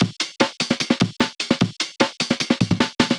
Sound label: 150 Cleanish Amen